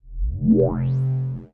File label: Interface Screen, Sci-Fi Space; Interface Screen 2